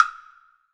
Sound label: R WBLOCK HI, Drum Mashines; EdgeSounds; Drum Mashines VOL-1; R-100 DRUMS